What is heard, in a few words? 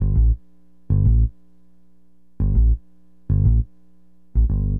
BASS, Acid Jazz Funk